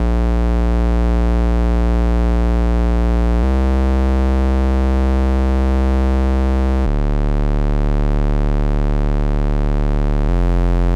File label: 140 BPM D Min Bass; Cymatics; Destiny Gold Expansion Stems; Last Flight